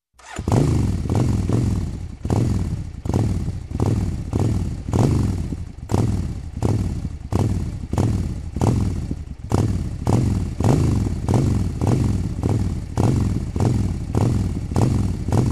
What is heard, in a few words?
Revving up motorcycle engine